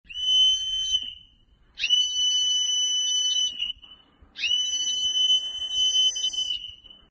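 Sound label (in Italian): OTTONE, fischietto arbitro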